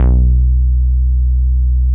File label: MOOG1 BASS B1